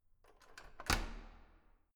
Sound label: door key unlock